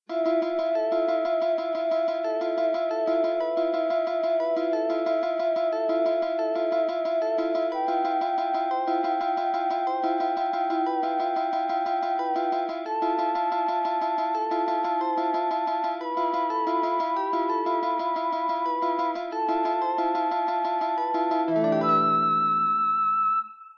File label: pieces for flute and guitar